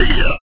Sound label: Kick, Kit; Sonic Foundry (Sony Creative Software); Esoterik Beatz; Agrymet